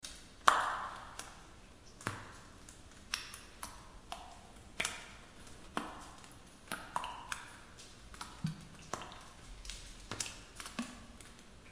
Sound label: underworld grotto